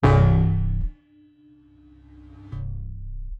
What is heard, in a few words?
bass pingpong; Polyend; Recue